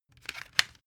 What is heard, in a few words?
cd cover close